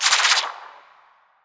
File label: attack Projectile; SPINELING